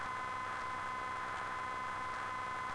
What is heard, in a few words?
strange fan hum